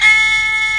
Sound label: HEAGUM F 3, KOREAN; AKAI S6000 CD-ROM - Volume 1